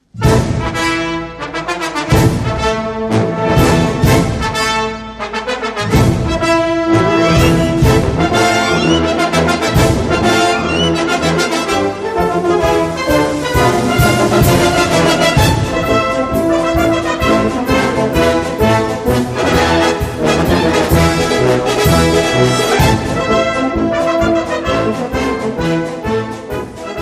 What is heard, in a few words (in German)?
konzertmarsch